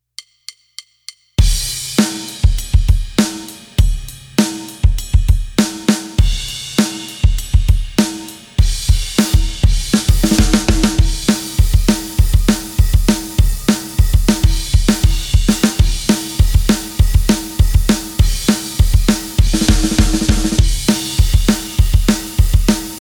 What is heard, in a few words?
drums